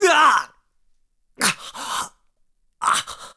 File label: Die; Link; zelda sounds; Skyward Sword